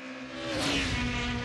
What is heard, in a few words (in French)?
taxi radar, doppler